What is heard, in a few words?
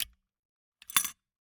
Gumball machine, candy door, plastic, open, drop, wide; Eiravaein Works; Vaeyan IV